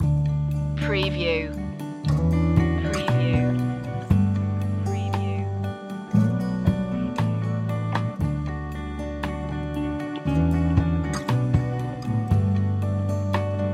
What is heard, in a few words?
simple thanks loop